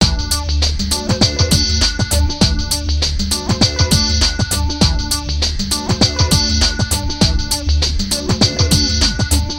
Arp 100bpm

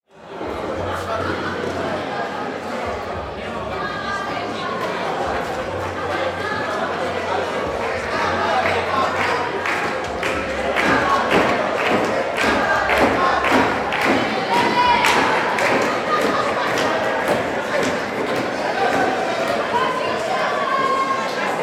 Party Crowd

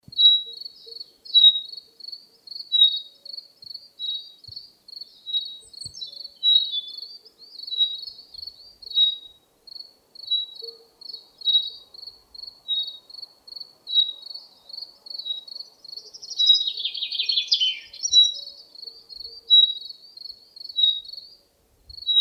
In The Forest

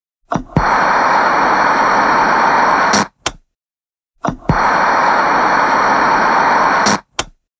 Crt Television Switch On Off Hiss Buzz Click

